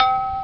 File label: click; bomber